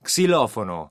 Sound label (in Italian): xilofono